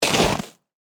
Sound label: Snow Crunch; Weather; Sound Effects:Weather